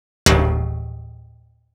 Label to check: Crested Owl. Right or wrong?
Wrong